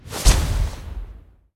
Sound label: portal